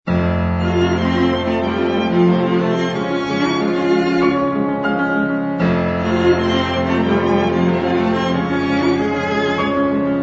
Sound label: piano quartet f maj; g jenner